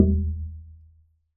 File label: Plywood Sheet Soft Strike